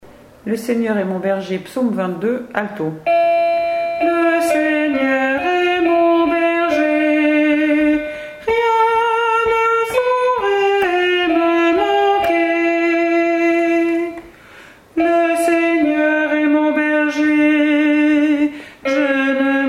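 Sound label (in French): Psaume Le Seigneur est mon berger Alto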